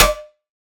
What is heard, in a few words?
Bell Reso Noise; Ableton Live 12 Lite; Core Library